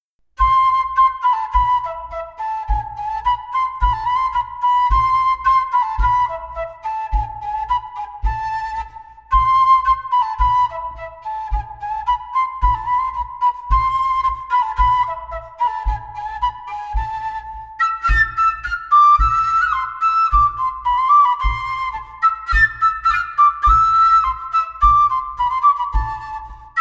Montagnarde Bourree; Souvenir d Auvergne

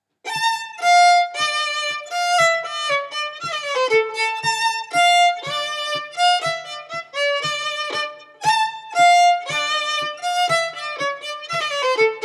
Bourree; Ms Aurillac

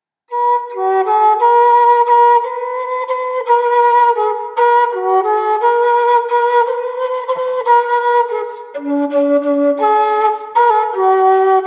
Romance; Le montagnard émigré; Romance de Châteaubriand 1807

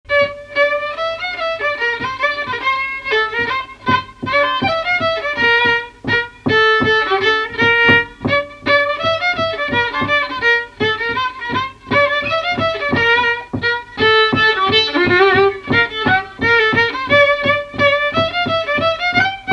Bourrée décomposée

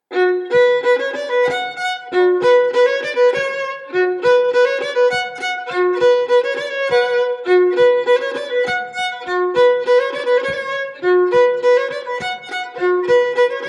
Montagnarde; Recueil Hainl 2; Montagnarde de Rochefort

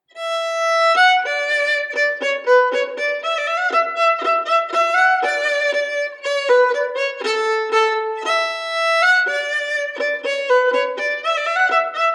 Bourree du Bourbonnais; Recueil Hainl

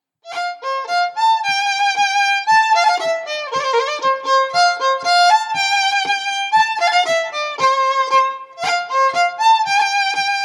Bourree

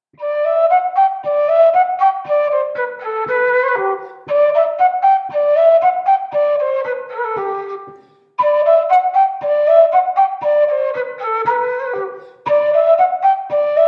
Anglaise

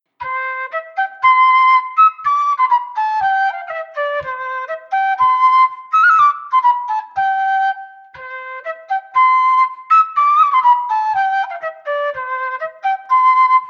La bourree; Revue creusoise

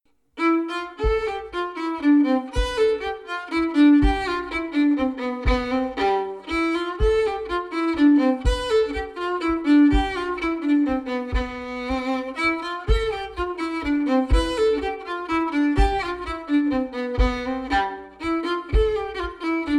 Valse

